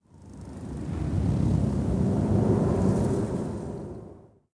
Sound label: WIND, wind